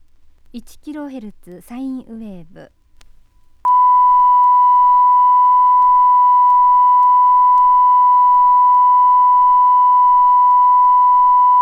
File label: 1kHz 5 cm per s minus 6dB